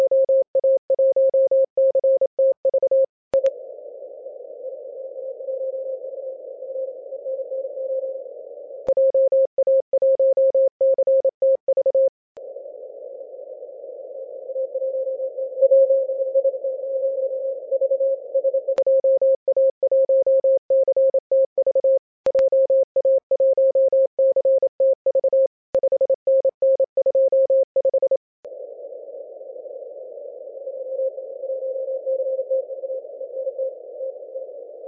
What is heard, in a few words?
21mhz